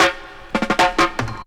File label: drum rolls; Roll it Flat; Jungle